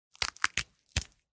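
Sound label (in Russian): сломали и бросили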